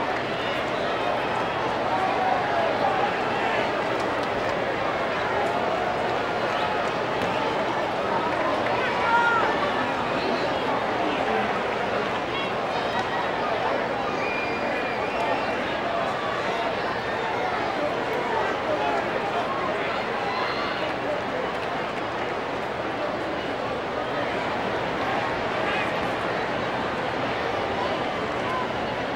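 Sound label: baseball crowd ambience loop; SFX Kit; Crowds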